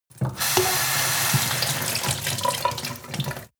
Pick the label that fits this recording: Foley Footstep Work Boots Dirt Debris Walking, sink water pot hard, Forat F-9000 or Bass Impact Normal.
sink water pot hard